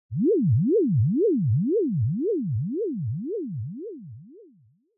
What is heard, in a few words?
Fantasize Low; 妄想; 低; シーン・妄想